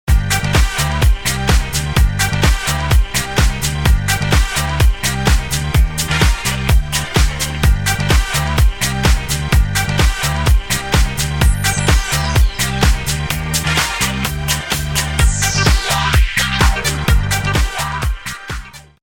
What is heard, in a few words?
Disco Alive, ringtones house latin; ghia productions